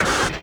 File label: biomech run engine